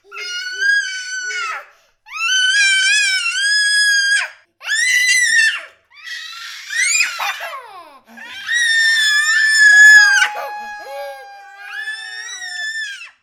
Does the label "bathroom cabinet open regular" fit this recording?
No